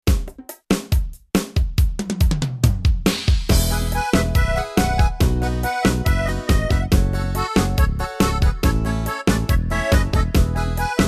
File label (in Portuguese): ritmos yamaha arrocha sertanejo